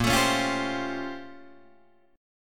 Bb Minor Major 13th Bb, Guitar, DADGAD; BbmM13; strummed; 8,12,11,10,10,10; JGuitar

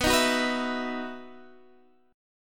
E Major 7th Suspended 2nd

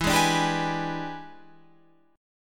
E 7th Sharp 9th, Guitar, Open F; E7#9; strummed

